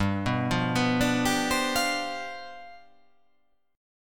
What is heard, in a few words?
G 11th